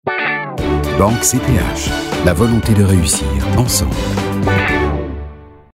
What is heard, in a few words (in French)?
Jingle